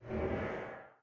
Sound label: swish; smite; god thanatos